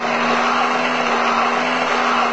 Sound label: tank engine